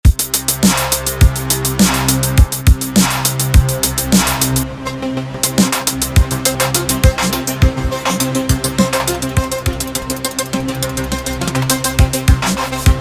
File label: arturia efx fragments